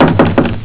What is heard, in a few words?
knock door